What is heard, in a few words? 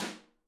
Snare Brush